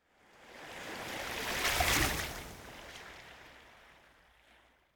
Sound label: Surf Water Lap Small Wave On The Coast; Hollywood Edge; sound designer's toolkit v2